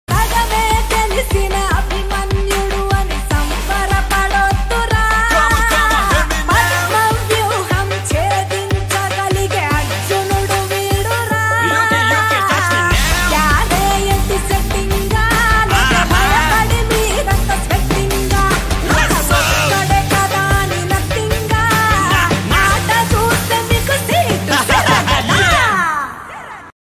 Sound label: interval bgm ringtone; a1 express movie